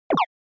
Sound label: SNES Action Stomp